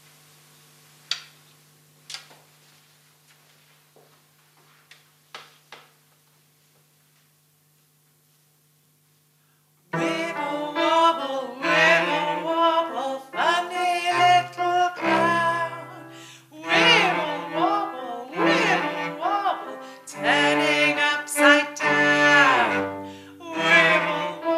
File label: Vocal and Backing